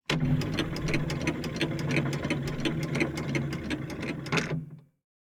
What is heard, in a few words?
Ambience Scatter Machines; Santuario